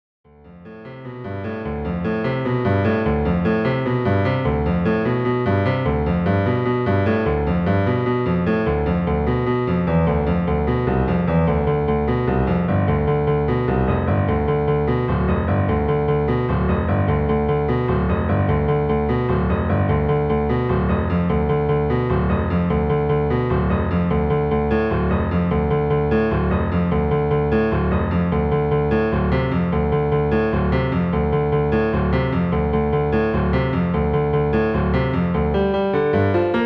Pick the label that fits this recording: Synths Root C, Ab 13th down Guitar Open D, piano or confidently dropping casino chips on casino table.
piano